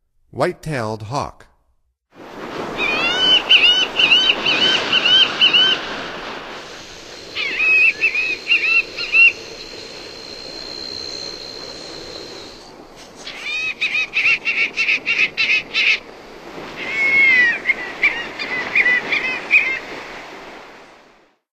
White-tailed Hawk, Songs of Birds of North America; Stokes